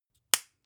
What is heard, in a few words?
spice jar small close hard; industrie wirtschaft; gastronomie